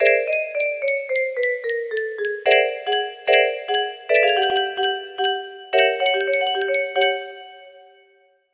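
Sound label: music box melody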